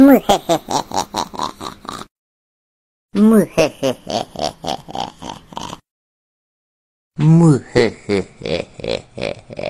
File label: mu hehehehe cat